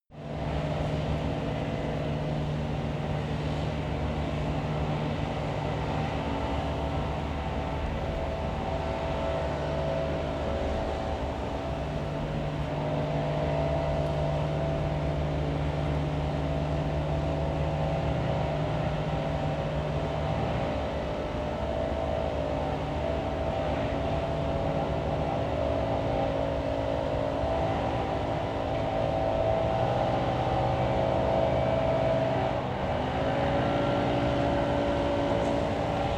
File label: suburb leaf blower